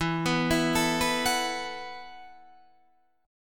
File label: E Suspended 2nd Suspended 4th E, down, Guitar, DADGAD